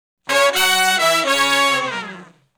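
Shuffle Blues Riff (Db) unison falloff, Horncraft for R&B; Sections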